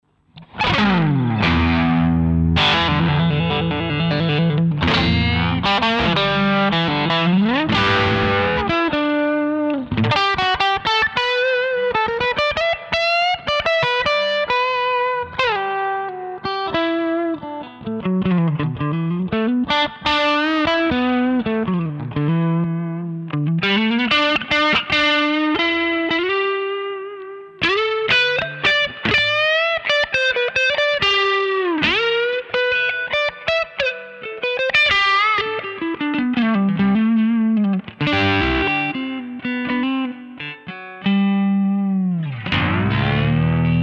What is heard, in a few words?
Overdrive Channel plus Harmonic Vibrato